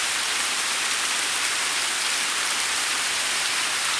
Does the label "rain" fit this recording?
Yes